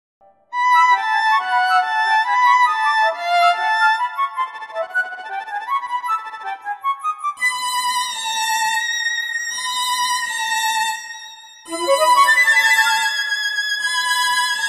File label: puzzle trio